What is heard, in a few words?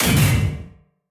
damage hits explosions, Warships